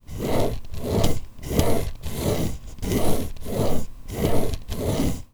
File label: recorded Line